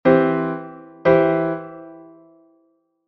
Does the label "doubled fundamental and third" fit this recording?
Yes